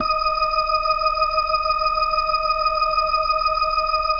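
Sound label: E flat 5, B3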